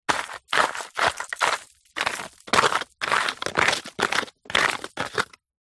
industrial fossicking trowel plastic digs scrapes dirt stones dry mud